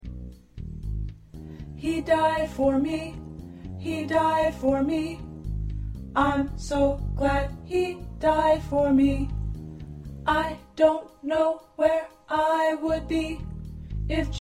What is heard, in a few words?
Tenor, choir